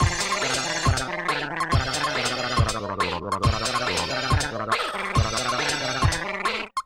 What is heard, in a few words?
Moonlight Tower C - Cartoon